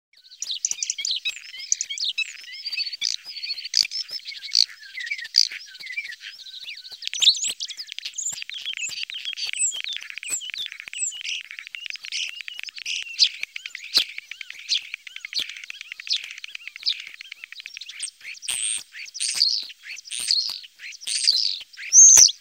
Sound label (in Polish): Szpak, Ptaki Glosy